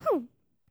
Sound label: Grub Sad; Hollow Knight